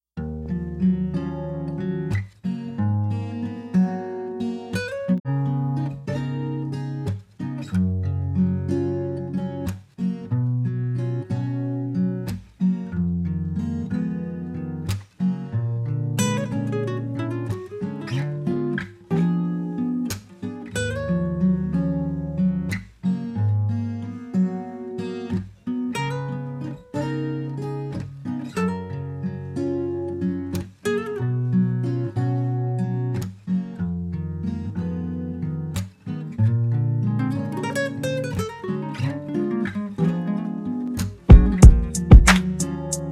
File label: Remix Instrumental